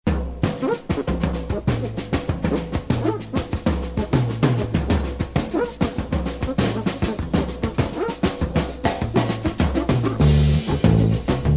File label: folk celebration